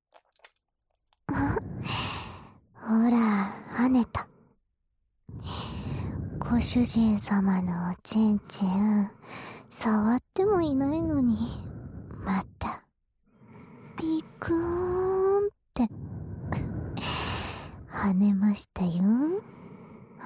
両耳舐め 焦らし, ASMR

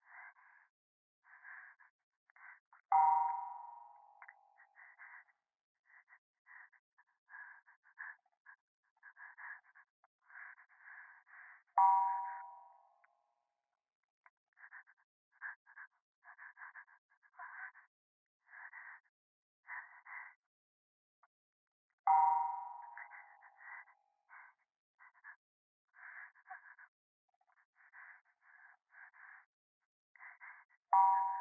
電話 右耳 快感 吐息 寺

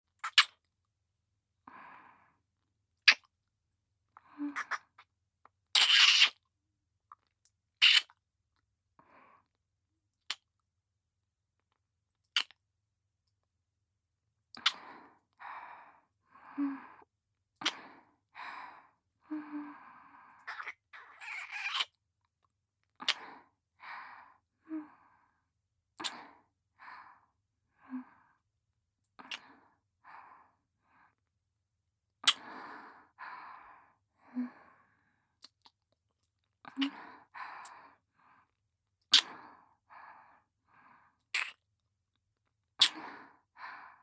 乳首舐め（バードキス）; 乳首舐めループ

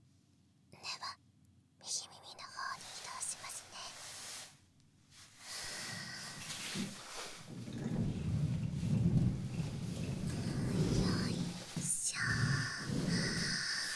右耳のトリートメント

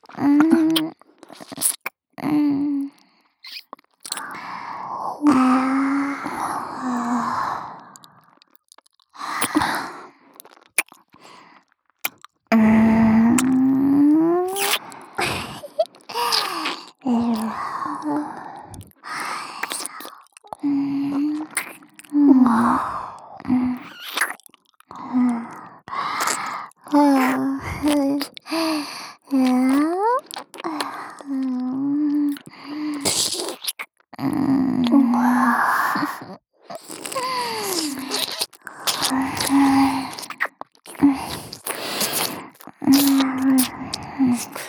双子姉妹のW耳舐め; SEあり

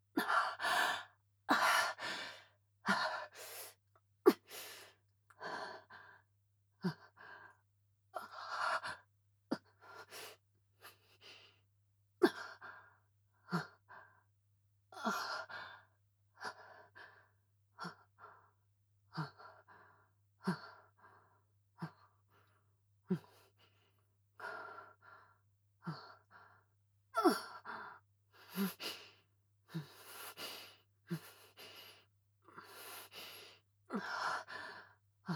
挿入中・息遣い・ゆっくり; B-18 アスティリアに挿入中・息遣い・ゆっくり

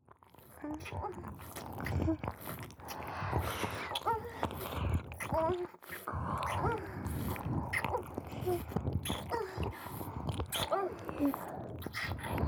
一生懸命フェラするお嬢様; あおいお嬢様; 犬にご褒美よっ、私のパンツ見せてあげるわっ♪ど、どう…う、嬉しい…素直に言いなさいよ…馬鹿っ…！

